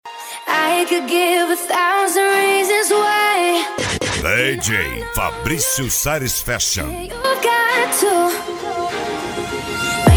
sound car mala aberta e mega funk

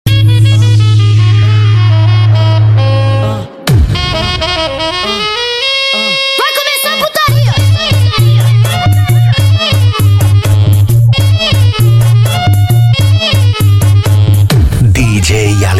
funk altomotivo; ap sport car som e insufilme

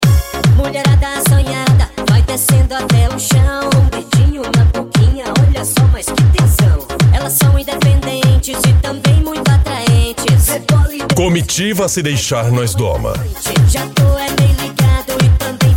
funk bass

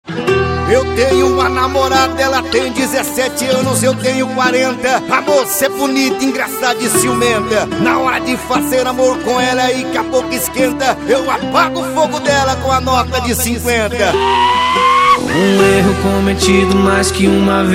sertanejo com locutores de rodeio

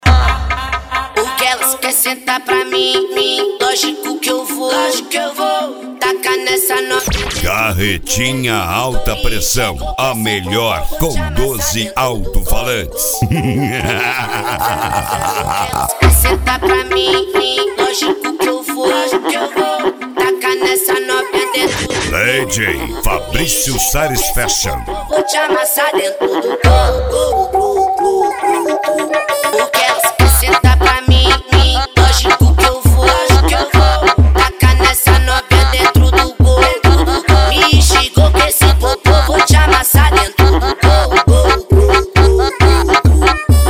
ritimada agressiva

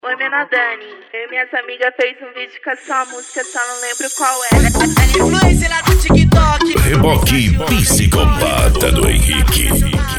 eletro funk

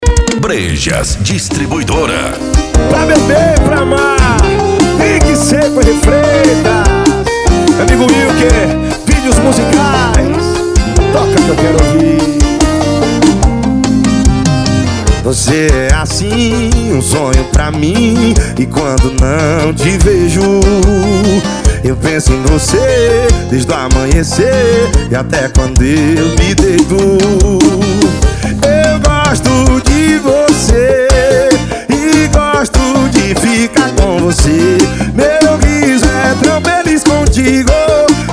esp sertanejo; brejas distribuidora

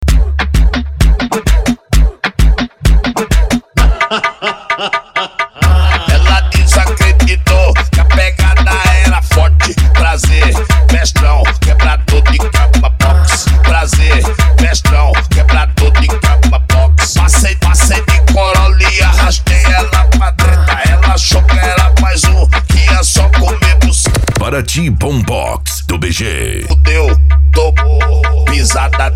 bombox funk

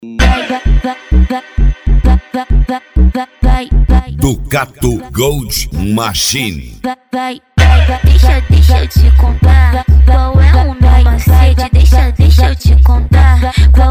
especial funk

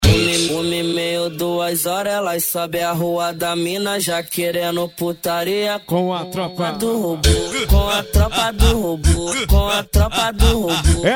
funk pisadinha sertanejo

